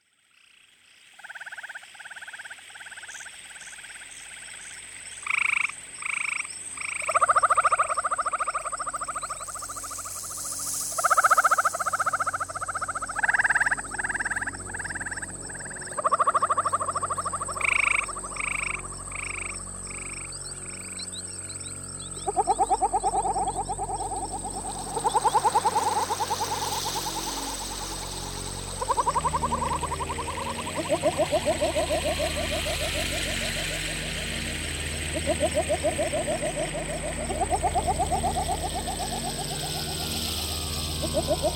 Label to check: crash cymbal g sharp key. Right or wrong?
Wrong